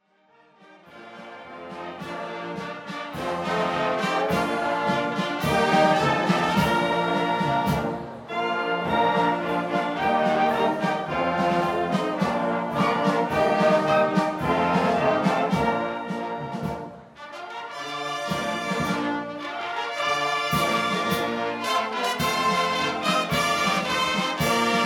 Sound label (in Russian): Оркестр